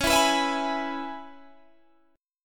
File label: C sharp Minor 7th C sharp Guitar Standard; C#m7; strummed; JGuitar